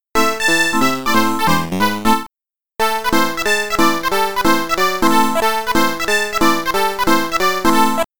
Round Clear - Time Left Count; Metro Cross arcade

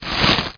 CROUCH

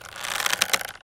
Rope

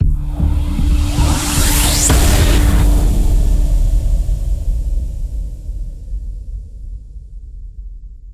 Transition; Everspace 2

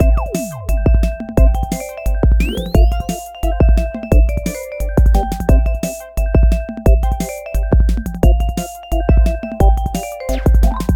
Ovenbreak X-Mas Theme; Innerspace

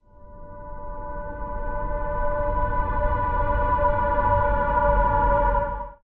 Amb Night Music; Fortnitemares

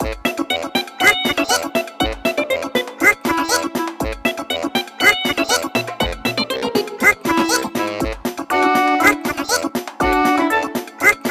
One Away!, Chant; Pikmin 3 Deluxe; Hocotatian Chant

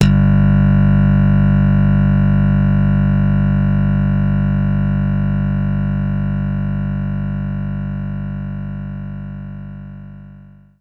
Slap Bass G2

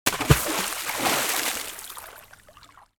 Splash

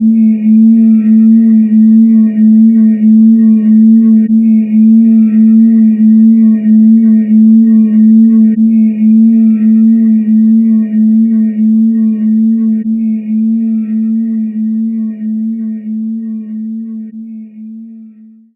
Buzz Yellow; Glow Artisan; DSiWare; nds

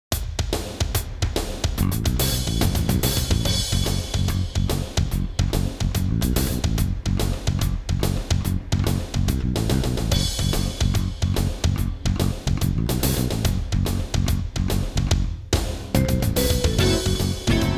Outdoors; Dark Sun Wake of the Ravager; ms dos

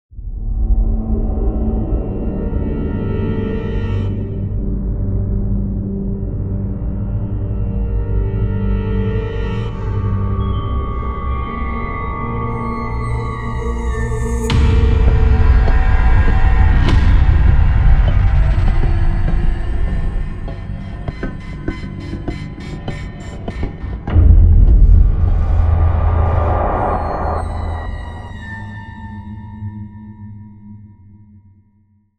Hunt - Ambient

